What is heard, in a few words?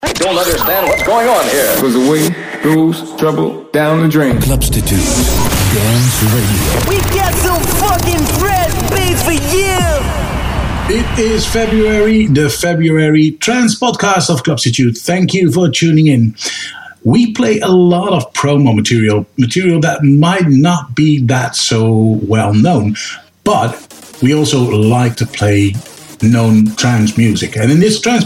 dance radio trance mixed; english